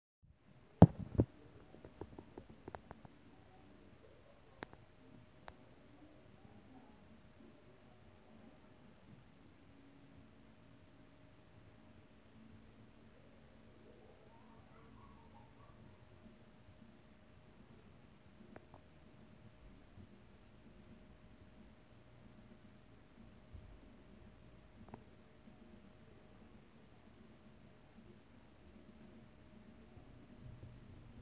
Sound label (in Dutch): muziek stemgeluid